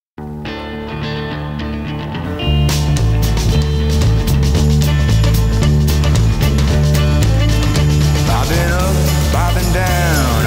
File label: Blues